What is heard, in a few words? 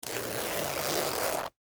Leather Jacket, Zipper, Up; Foley; Clothes; Dressing; BlastWave